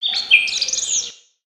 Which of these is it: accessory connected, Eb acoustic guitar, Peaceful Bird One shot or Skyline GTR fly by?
Peaceful Bird One shot